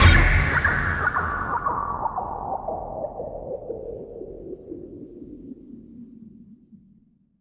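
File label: SYN left, Production Effects; Best Service - XXL Production Effects; SYN-FX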